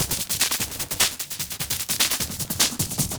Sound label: Beat, Industrial